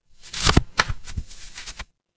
paper fabric page turn

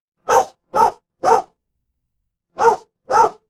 animal dog bark

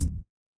feedback fail

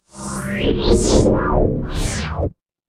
magic scifi teleport

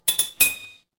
ui chime

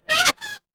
cartoon squeak

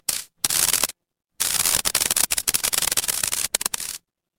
fire electric spark

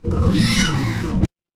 mechanical servo

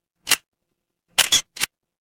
office camera

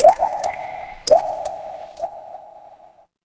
water drip

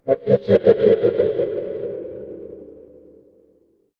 magic scifi spell

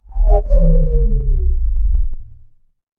transition sweep

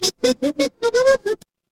feedback level up

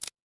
mechanical equip

